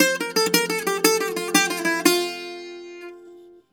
BOUZ F+08, bouzou

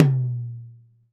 RAW TOM, Drums Acoustic; USB Soundscan vol.10; AKAI; 90s sample CD; RAW KIT 1

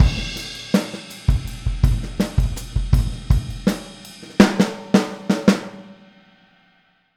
Boogaloo - Outro - Full Mix, 82 BPM; Sonic Foundry; Drums from the Big Room The Mixes

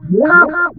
Vox FX, Funky Vocals, Autotuned; USB Soundscan vol.18; AKAI; 90s sample CD

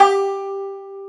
Tenor Banjo G4; Multisamples; Digital Sound Factory Planet Earth

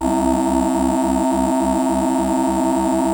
PPG VOX A C3; DIG VOX; Music Store - Claudius Diemeter Schwerlos

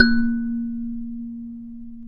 A#2, Kalim M'Bira; NorthStar; Global Instruments

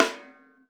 RAWSD7V1, Drums Acoustic; USB Soundscan vol.10; AKAI; 90s sample CD; ALL SD

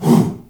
"hoo", shout, Africa; Spectrasonics; Heart of Africa 1

